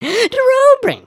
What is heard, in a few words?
just kidding, emotes